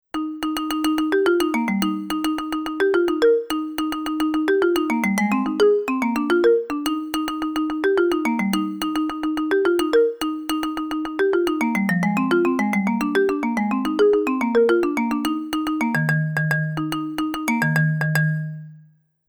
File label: marimba